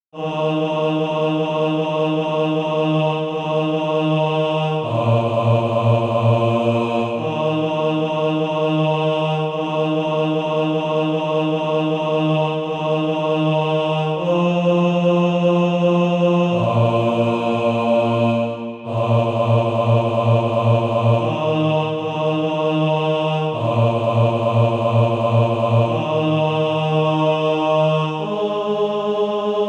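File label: bas, Practise Music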